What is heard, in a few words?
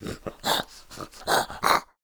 idle, zombie; DayZ-Epoch; dayz sfx